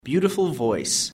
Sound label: beautiful voice; giong hat hay